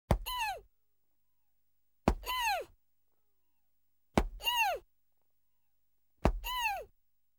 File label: Tum Punches 4; BBC Sound Effects Library